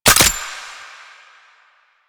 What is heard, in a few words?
Gunbrella Low Clip Mech, Weapons; Warframe; Tenno; Koumei